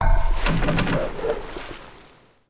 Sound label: Build Galley; Civ4 warlords